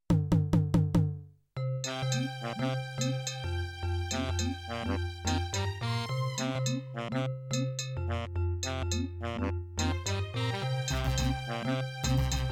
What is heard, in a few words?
Instrumental